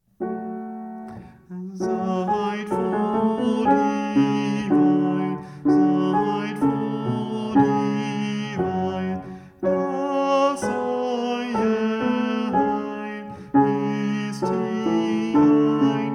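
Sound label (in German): Seid froh Sopran, Bach-Choräle Sopran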